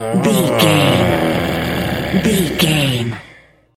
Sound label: voice male growl